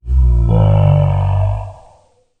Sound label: Dinosaur Triceratops